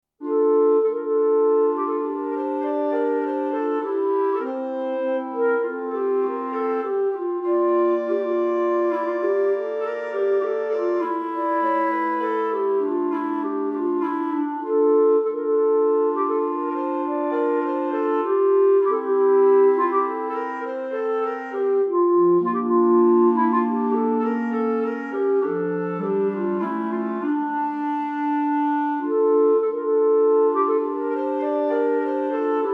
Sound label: bourree